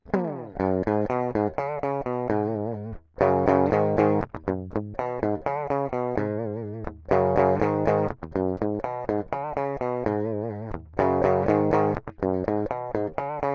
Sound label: direct clean